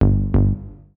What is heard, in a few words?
BASS SHOT HOUSE 125 A; eJay; Singleshots